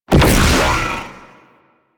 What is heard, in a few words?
Sentient Ghost Caster Orb Fire; Warframe; DE; Sentients; Sentient Eidolon Ghost Caster; Abilities